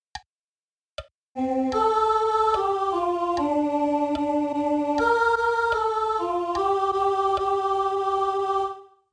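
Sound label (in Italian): Salmo, tenor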